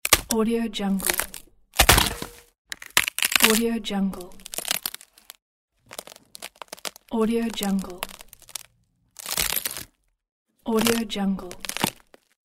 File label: wood stress crack